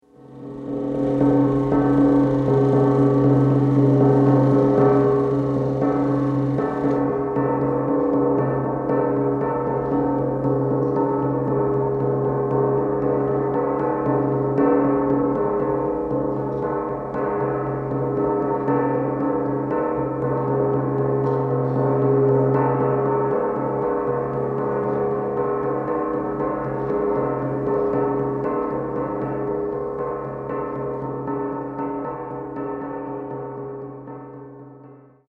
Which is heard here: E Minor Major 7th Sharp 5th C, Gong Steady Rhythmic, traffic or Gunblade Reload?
Gong Steady Rhythmic